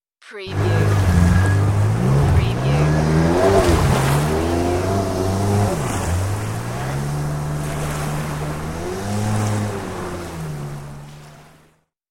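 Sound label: Jetski Taking Off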